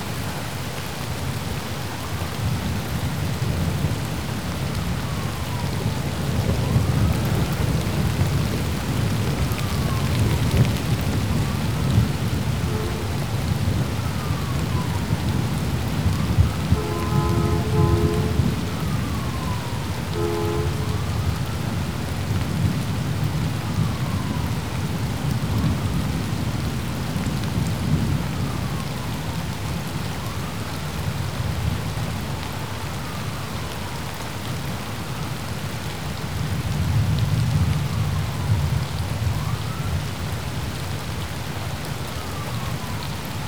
Rain and Sirens; FX; Cymatics; Eternity Sample Pack

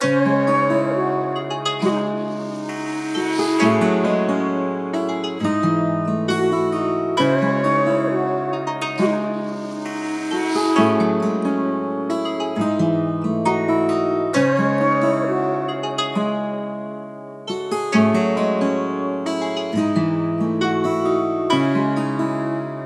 134 BPM C# Min, World; Cymatics; Monarch Melodies; Senses

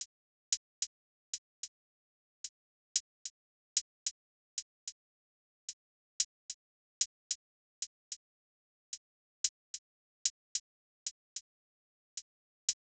Nameless Drum Loop 74 BPM Hihat, Lofi; Cymatics; ANALOG EVOLUTION Beta

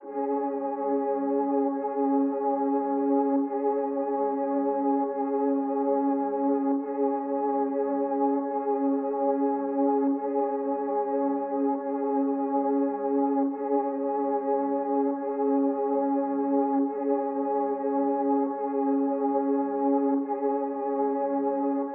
143 BPM C# Min Pad, Drill; Cymatics; Nothing Left

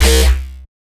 Bass Shot, dubstep and trap; Ghosthack; Dubstep and Trap Pack 7

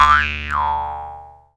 mouth harp, Cartoon Film Effects

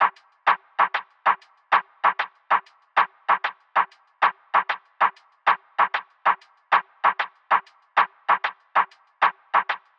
Laminated Percussion Loop - 96 BPM, Hip Hop

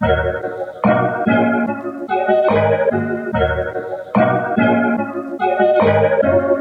Classic Melody Loop 145 BPM D# Min; Cymatics; Oracle Sample Pack

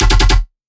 Bass E Hornet, Dubstep; Bass One Shots; Ghosthack; Dubstep Sound Arsenal; 50 Bass One Shots

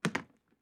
Dropping Briefcase; Fairlight Sound Lib

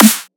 Dubstep Snare; Ghosthack; Dubstep Sound Arsenal

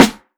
Garage Snare - C#; House; Drum One Shots; Snares

